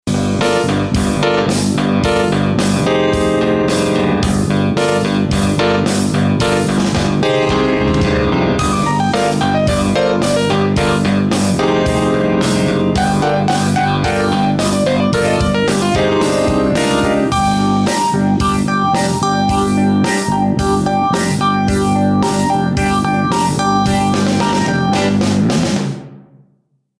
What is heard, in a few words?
Yamaha CP80 Rock; Steinberg Absolute 5; The Grand3